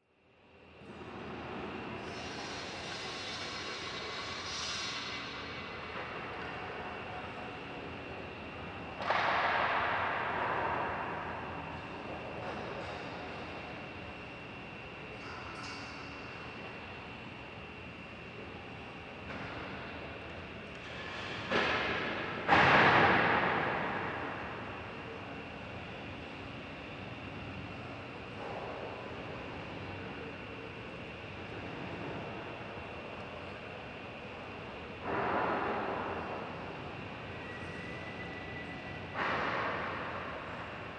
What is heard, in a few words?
Internal Industrial, factory